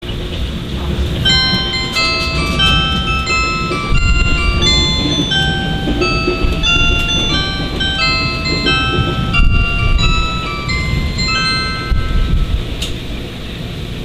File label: chime, railway; jyouetsu